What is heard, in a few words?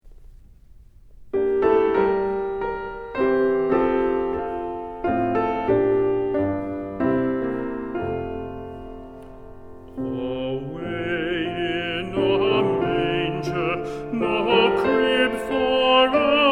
Folk Melody; Away in a Manger